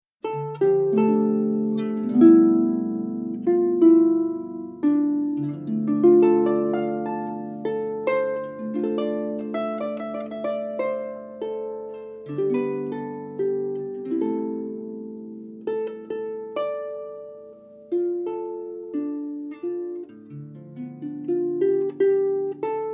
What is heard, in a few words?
harp